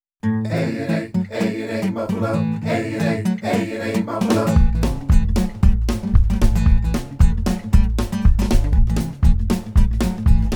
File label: MUFFLER JINGLE; WKXY; AA MUFFLER